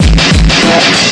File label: exploding head, drum loops and breaks